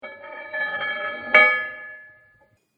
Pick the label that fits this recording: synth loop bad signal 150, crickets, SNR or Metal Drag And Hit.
Metal Drag And Hit